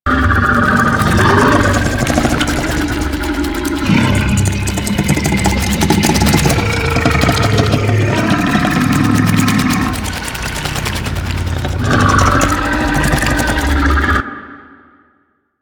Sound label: Octopede Idle Loop; Warframe; Man In The Wall